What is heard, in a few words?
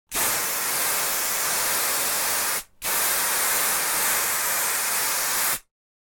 hair spray